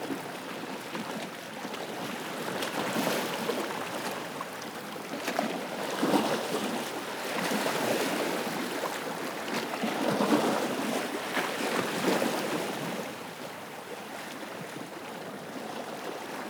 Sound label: Rain Sound of Nature - Waves Dock Lapping Calm